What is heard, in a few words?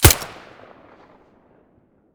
silencer fiveseven mono, weapons